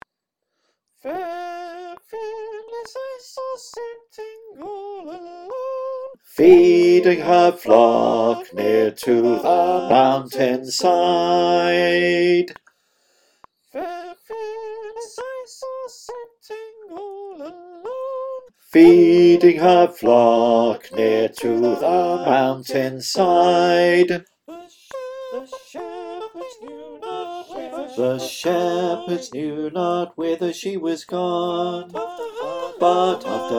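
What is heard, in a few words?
Bass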